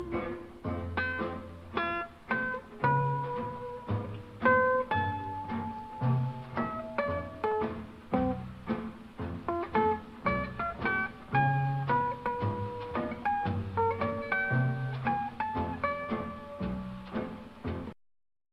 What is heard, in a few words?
artificial harmonics; django